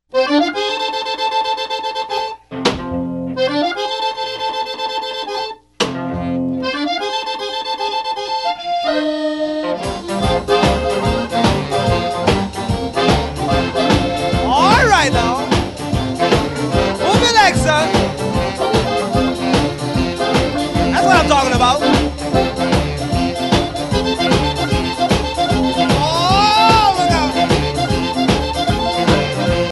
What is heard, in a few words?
Squeeze Box Boogie, Accordion